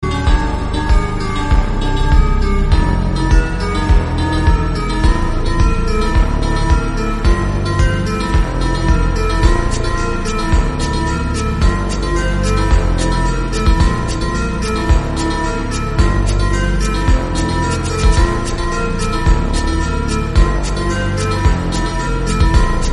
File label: super slowed bgm